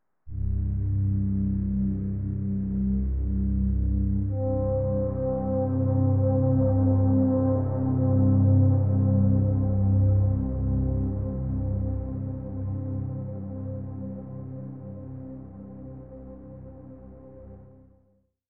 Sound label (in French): pad avec delay bbd; valhalladelay